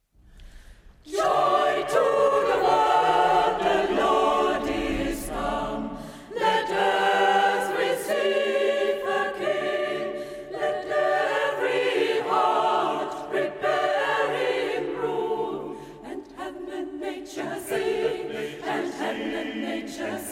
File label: Voices Pur Char Joy to the world